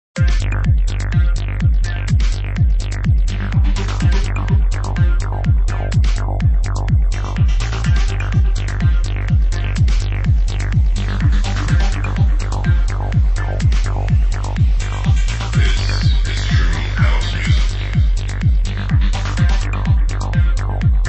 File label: Dark Techno Elements; Digital Dimension Audio